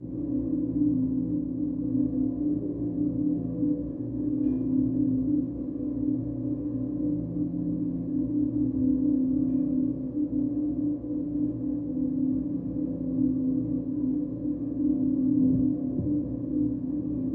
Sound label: Ambience Machine Dr; drones & tones